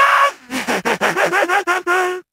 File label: zombie laugh